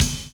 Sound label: DOUBLE BD; Univers Sons